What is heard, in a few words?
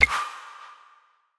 Ui Locations Button; portal quest android